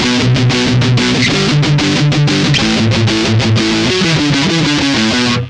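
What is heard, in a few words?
Strat Mesa neck, Neck pickup, Sansamp в режиме Mesa/Boogie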